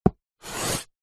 office chalk stick thick draw line on small chalk board